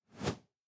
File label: upgrade zoom in; my Buildings; City Verse Tycoon